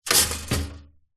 household toaster modern press down

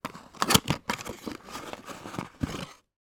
foley box lightbulb remove; zapsplat

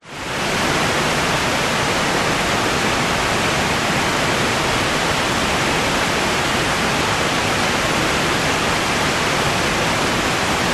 waterfall Germany; Allqaeu

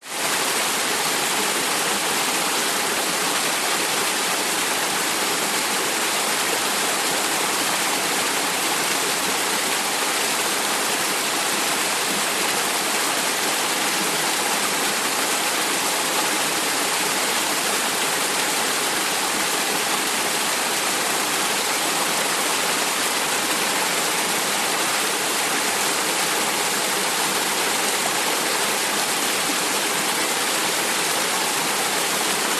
nature waterfall small creek rainforest; zapsplat

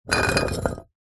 bottles x2 beer full movement scrape on concrete; foley; zapsplat

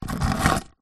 brick small move scrape on dirty concrete; foley; zapsplat

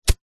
sport soccer ball single bounce on grass

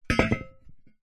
metal heavy chunky item set down on hard floor; foley

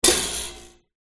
impact metal thin twang

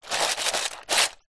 foley shells in plastic container movement; zapsplat

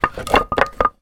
foley wood blocks small movement; zapsplat

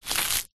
nature ice thin crispy movement crush

